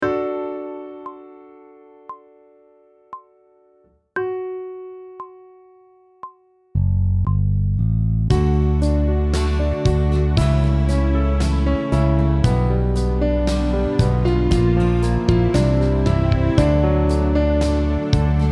Sight Singing backing track, pop vocals; Grade 8

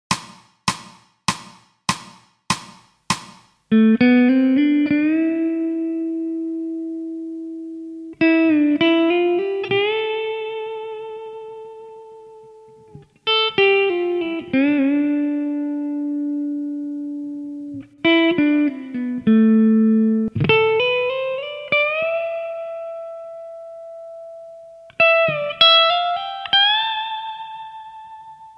Technical Study, electric guitar

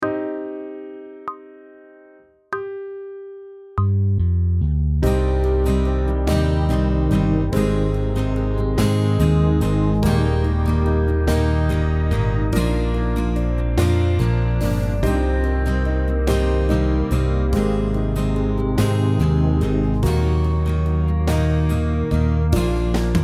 Sight Singing backing track, pop vocals; Grade 7

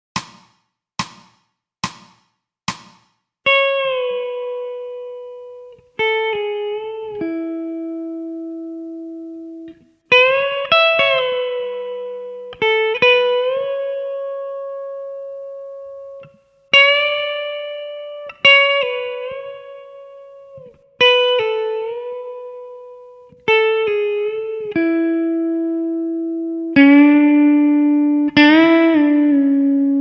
Technical Study, electric guitar; electric guitar performance diploma

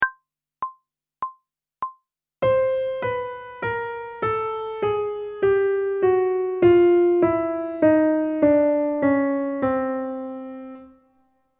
Chromatic Scale one octave descending, pop vocals; grade 4; Technical Work

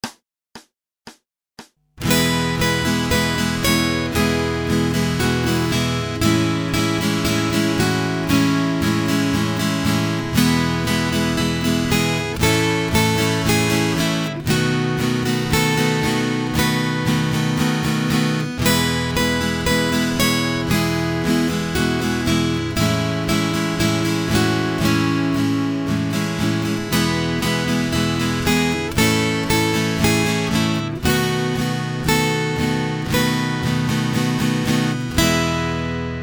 Acoustic Accompaniment, acoustic guitar

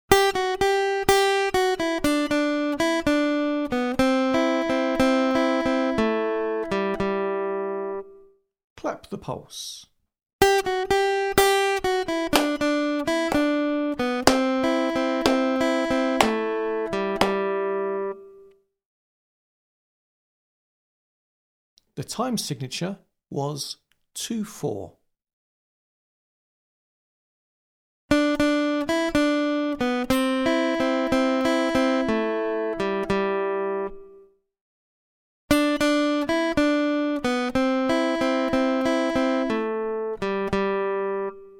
Aural Rhythm Test, acoustic guitar; Grade 8

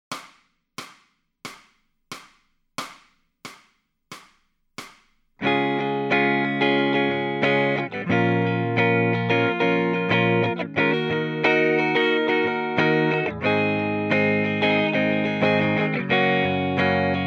Lead Backing Track, electric guitar

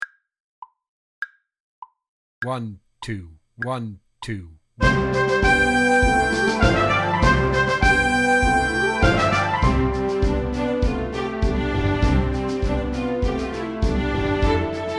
March Full Mix, drum kit

